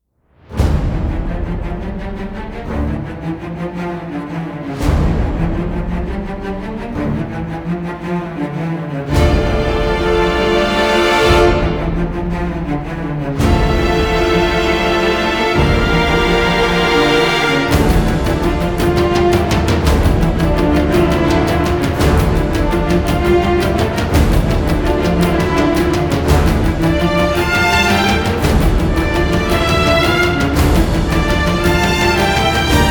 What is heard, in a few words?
no choir